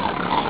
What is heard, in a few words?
pig; pigs